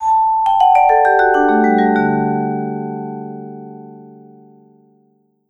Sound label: VBLCK, VIBE LICKS; Sampleheads - Dave Samuels Marimba & Vibes